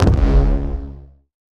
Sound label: hit wave hard; CrossCode; battle